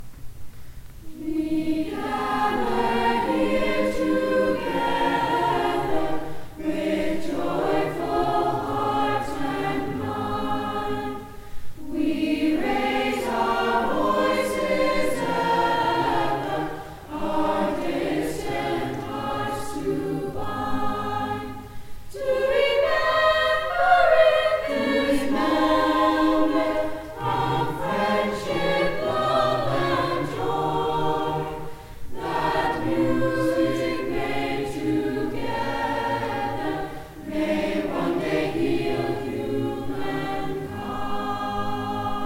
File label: Chorus; We Gather Here Together; 16th century German madrigal; St. Mary Magdalene